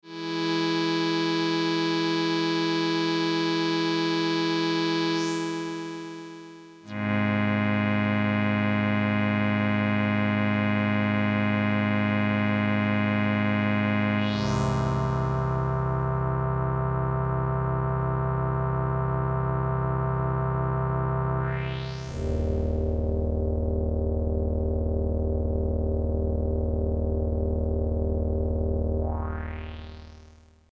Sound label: Kijimi dry